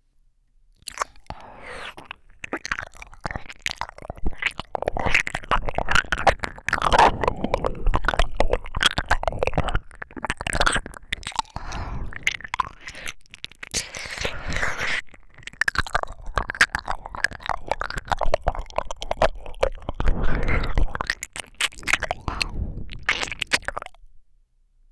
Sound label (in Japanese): 激しく耳舐め